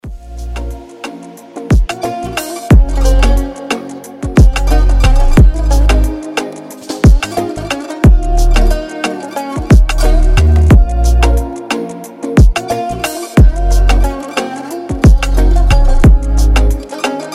oriental type beat

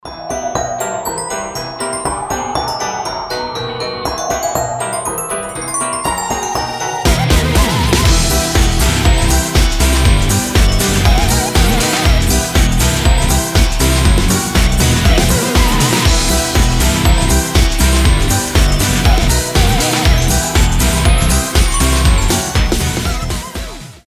happy halloween

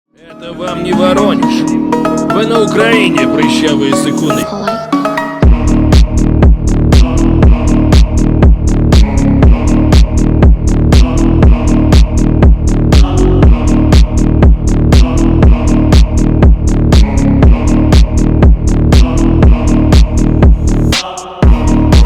rave phonk

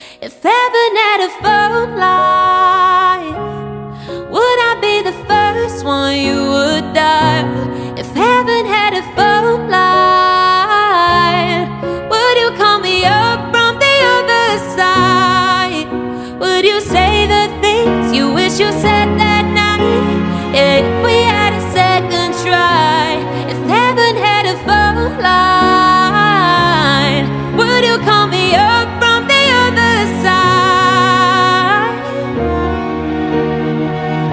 acoustic

